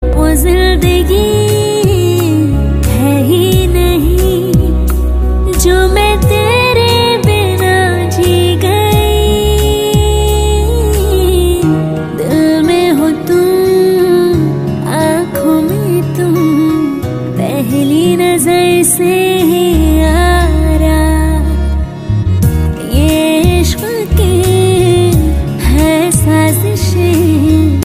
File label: Female